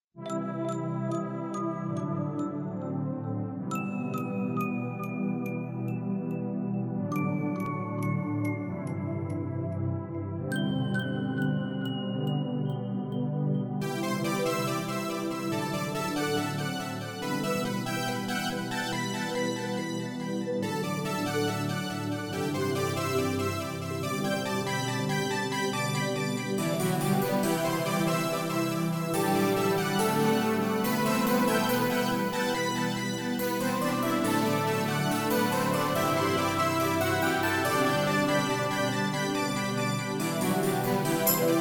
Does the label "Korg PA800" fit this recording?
Yes